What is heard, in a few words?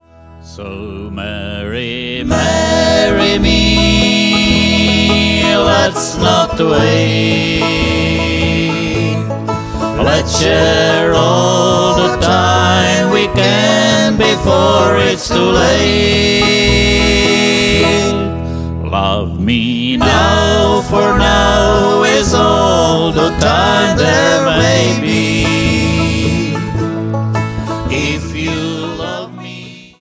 Distant Drums